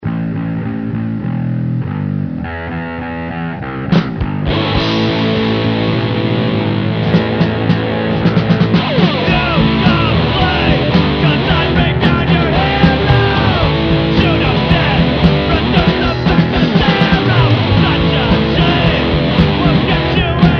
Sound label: rifle